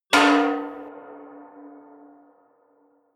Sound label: large can reverb, hits impacts